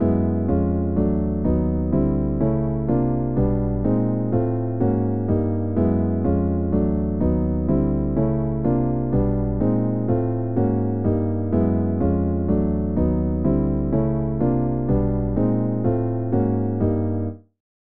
Reharmonized Shepard Tone

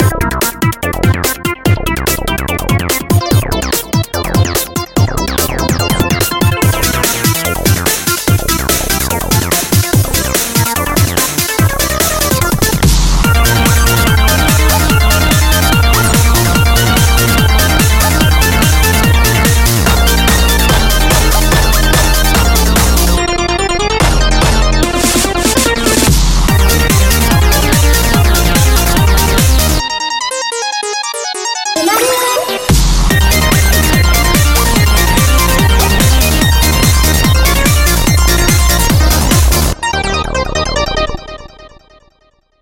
ARP 303 Saw

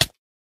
Damage; Minecraft

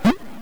pull pin, hegrenade; weapons; kirby; CS ForKids

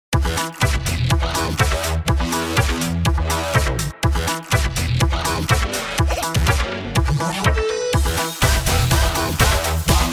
Dubstep

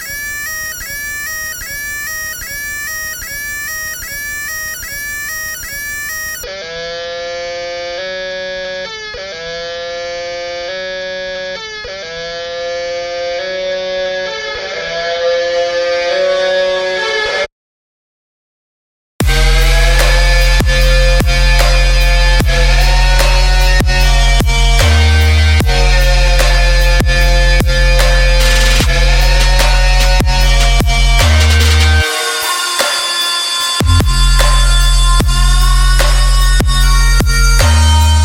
new-age contemporary neuro folk disco

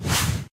Whoosh

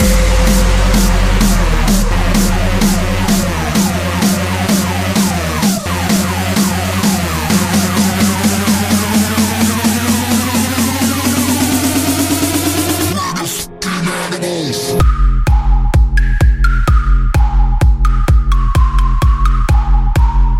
EDM Remix

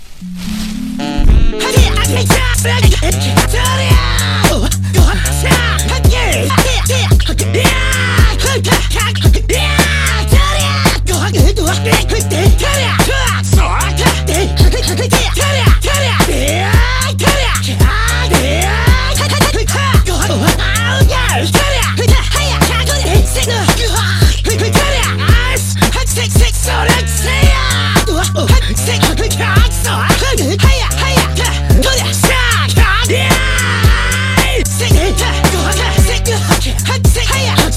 vocals

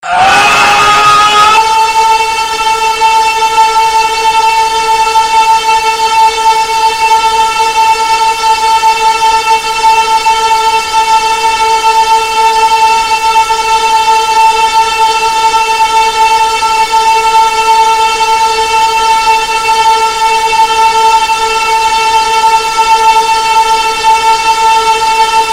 Screams

